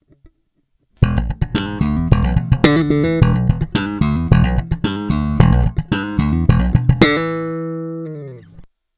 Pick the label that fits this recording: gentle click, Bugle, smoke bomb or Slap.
Slap